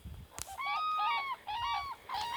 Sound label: grus